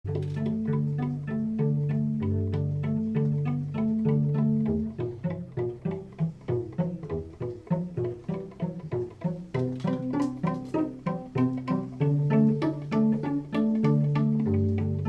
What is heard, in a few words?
String Quartet; Classern Quartet